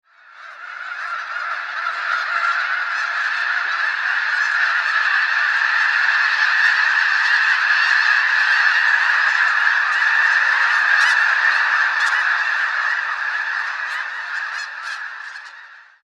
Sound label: snow geese flight; Ringtone